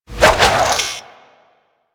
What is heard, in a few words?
Movement Flip Whoosh; Enemies; Warframe; Kela De Thaym